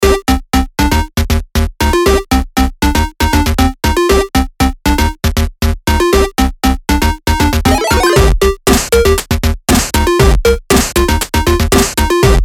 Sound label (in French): musique fond; python2dspiel